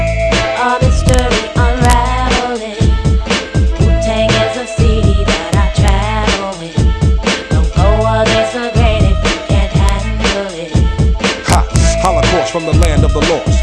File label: hiphop